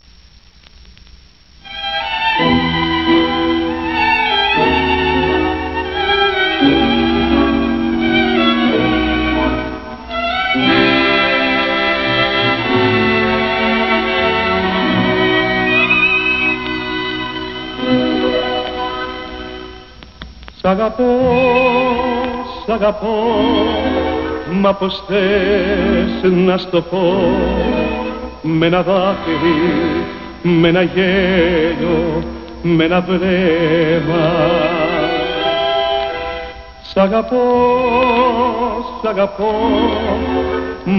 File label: bouzouki; de plaka